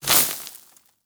slide start; aragami

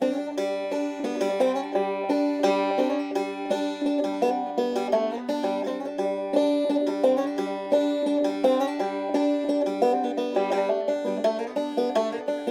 String; gamerip; Borderlands 2

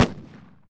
Fireworks; virtual city windows gamerip 2009

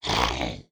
Monster Growl

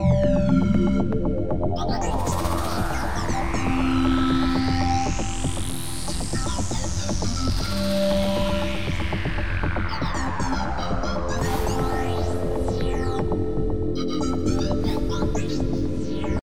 Alarm; Hard Truck Apocalypse: Rise of Clans; Ex Machina: Meridian 113

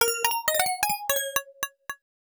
COIN; Kuri Kinton; Taito L System; arcade

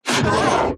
pain

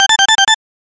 Unused Jingle; Super Double Yakuman 2; SNES

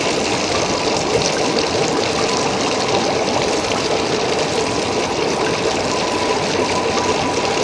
Onsen Ambience; Slime-san 2017 pc gamerip

